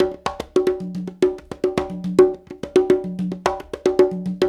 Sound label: Latin Congas, African Contemporary; Spectrasonics; Supreme Beats